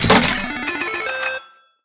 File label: slot win